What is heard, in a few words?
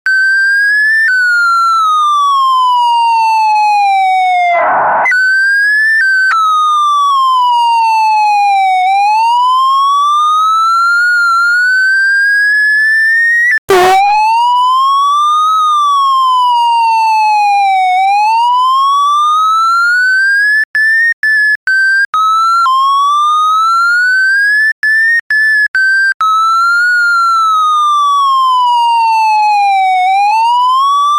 police cop entry music; law enforcement